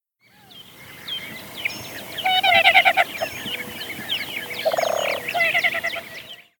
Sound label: Lesser Nighthawk